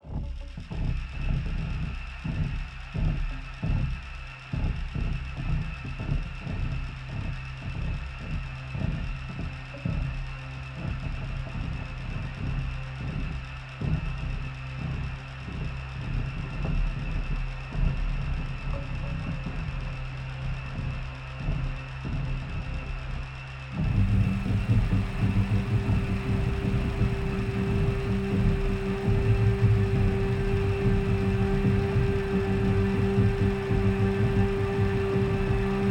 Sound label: FOREST ROOTS UNDERGROUND WORLD E PHRYGIAN 81 BPM